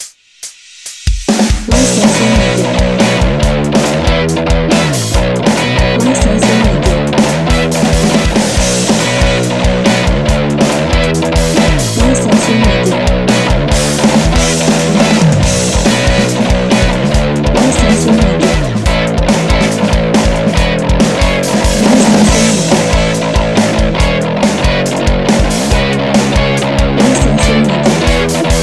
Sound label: Rough Bass